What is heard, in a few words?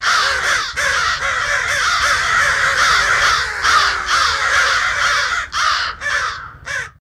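Crows Cawing Fighting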